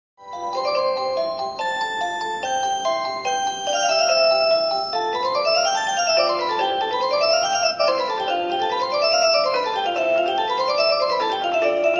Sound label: Piano Sonata